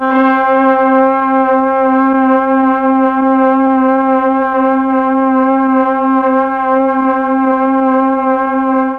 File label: C4 BRASS, mellotron; HALFSP.BRASS